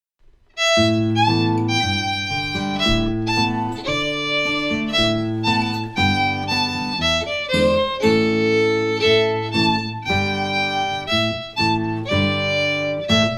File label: Cluck Old Hen slow